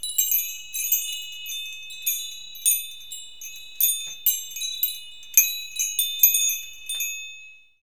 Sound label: Wind Chimes; Porcupine Productions